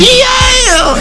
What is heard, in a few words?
yeaah